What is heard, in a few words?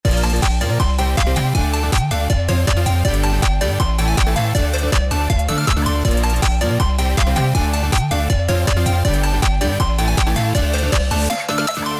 Power